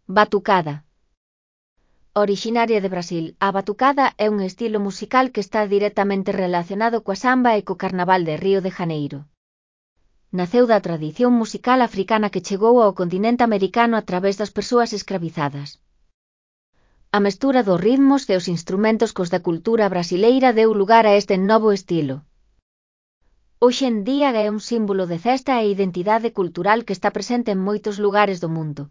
BATUCADA

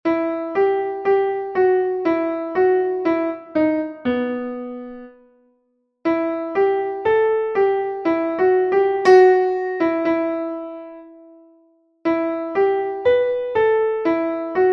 melodic reading

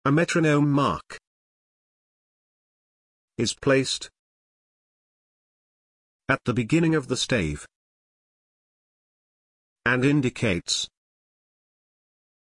dictation metronome mark

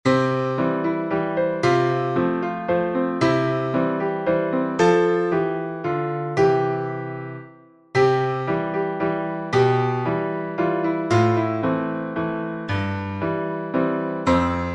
Piano

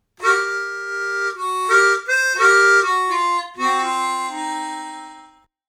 harmonica

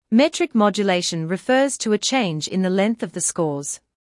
metric modulation

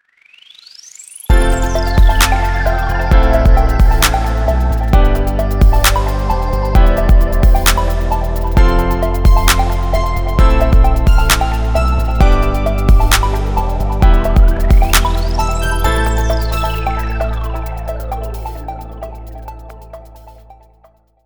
corporate music rise audio logo

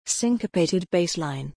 syncopated bass line

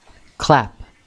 clap

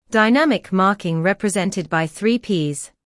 pianississimo

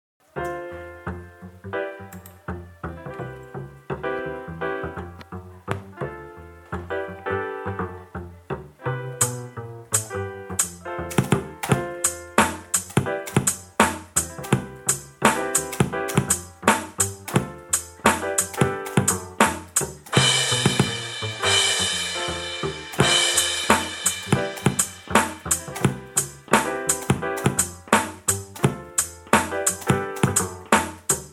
JAZZ

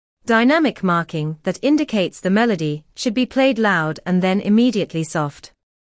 fortepiano

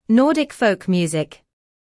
Nordic folk music

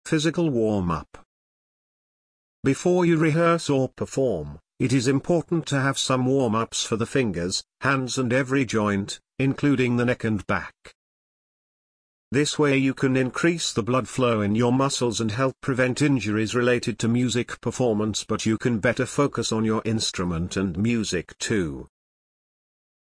warming up

